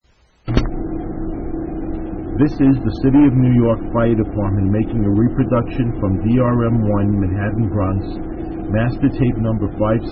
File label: ems main entrance; 9/11: Emergency Calls; EMS Main Entrance Tele